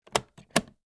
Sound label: briefcase lock